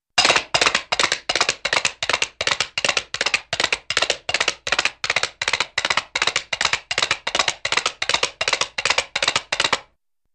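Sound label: Funny Rapid Horse Hooves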